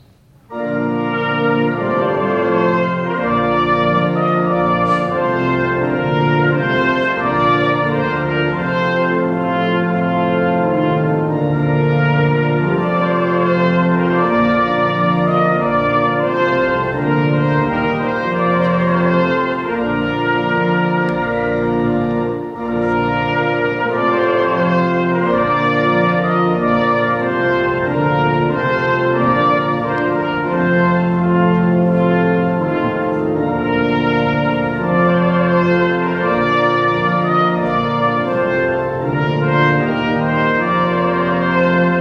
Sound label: Trumpet Voluntary